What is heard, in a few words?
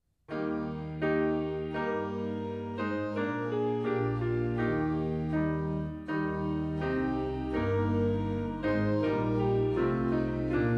SDA HYMNAL SONGS TUNE